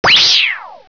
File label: POWER